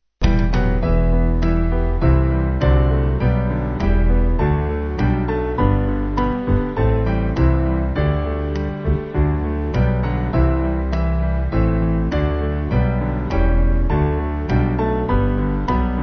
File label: Band